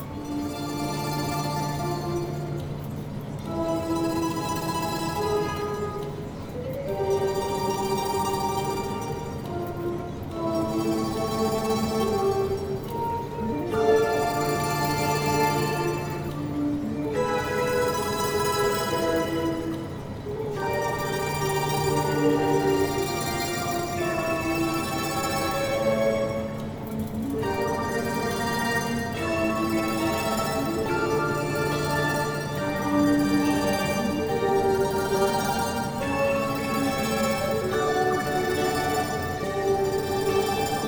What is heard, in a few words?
ambience general; turn your fortune